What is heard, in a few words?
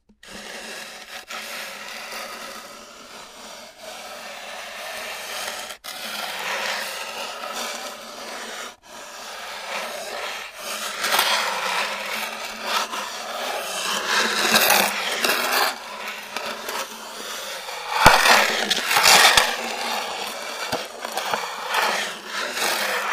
Dragging a wooden object across the floor